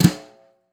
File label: Steel Brush Flam, Snares; Ghosthack - Free Snares 2023